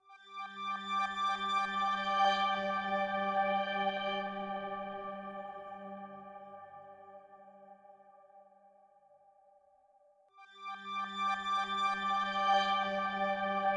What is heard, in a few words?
Progression Stinger